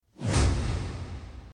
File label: Basic Whoosh; SoundsCrate